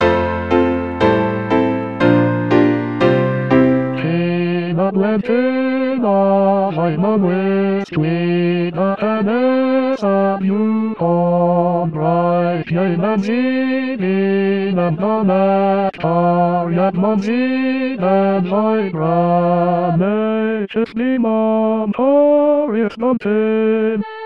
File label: Bass